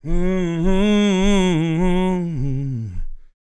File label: MOANIN, Vocal Planet Blues & Soul; ILIO; Vocal Planet VOL-2